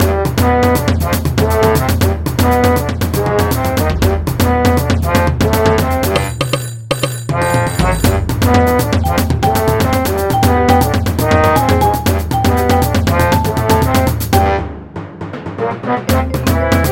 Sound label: Stage Music